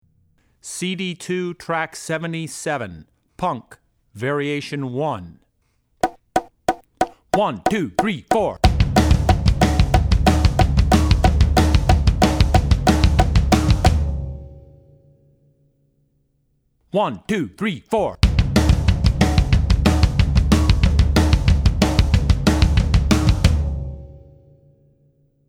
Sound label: Punk; Drummer's Bible Tracks